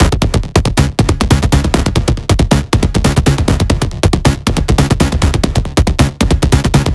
Root C# 138 BPM; Vengeance Samples; Special Sounds

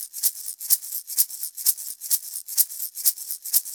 Shaker Loop, 128 BPM; Vengeance Samples

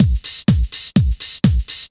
drumloops 125; house; PS-28 Vocal House Samples; livezone

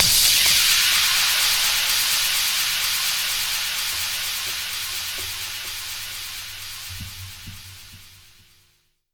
FX Downlifter

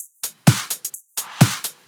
House loops 128BPM; Vengeance Samples

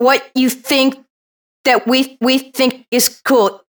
House Loops, Vocal Loops House 128BPM

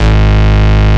Ultimate Bass G# Root G#; Vengeance Samples

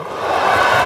Ambiences, Places and Ambience

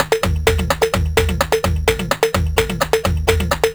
Electro Loop 128BPM; Vengeance Samples

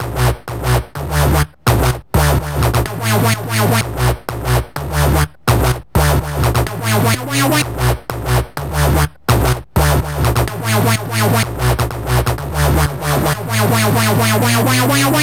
126BPM Melody; VFH1 126BPM Comeback Kit

